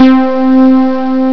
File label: Arco Strings; ST-XX Amiga Soundtracker Sample Packs